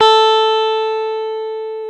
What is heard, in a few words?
GTR, Dual Guitar; Club-50 - Foundations; Paul